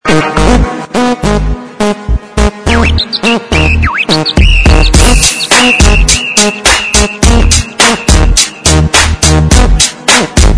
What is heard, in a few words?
bass